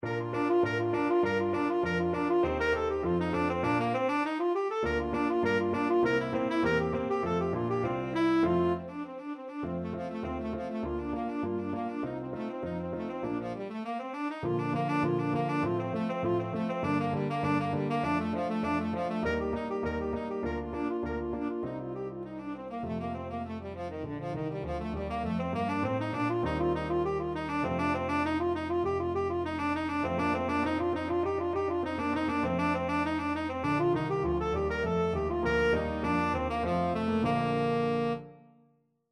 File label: tenor saxophone